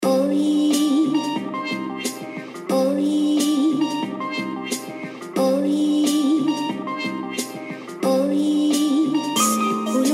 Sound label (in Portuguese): reggae do nada